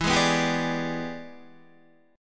E 7th Sharp 9th Guitar Standard, chord; E7#9 strummed; JGuitar

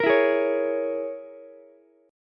E 6th Flat 5th E down Ukulele; E6b5 strummed; JGuitar

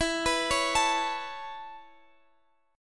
Db Minor 7th Sharp 5th E

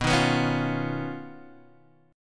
Eb 7th Suspended 2nd Sharp 5th, B

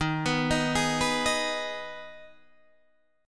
Eb Suspended 4th Sharp 5th, Guitar, Open D; 13,14,13,14,14,13

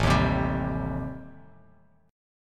A-Minor 7th Flat 5th, Bass Standard, chord; strummed; JGuitar

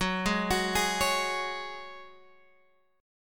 F sharp Suspended 2nd, Guitar Open G

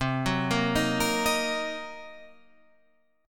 C Minor 7th Flat 5th, chord; Cm7b5; arpeggiated

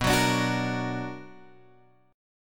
B Minor Major 7th, Guitar, Drop D

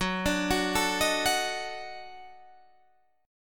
Eb Minor Major 7th Flat 5th Gb, chord

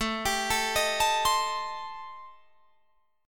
A-Minor 7th Flat 5th, Guitar Drop D, chord

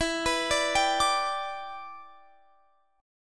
E Minor 7th, Guitar, Standard; Em7; arpeggiated; x,19,21,19,20,22; down; capo=3

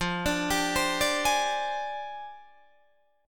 F Minor 6th Add 9th F down Guitar Open D

